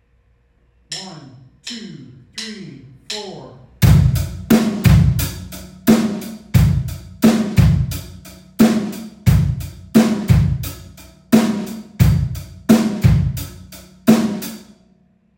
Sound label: eighth kick, drums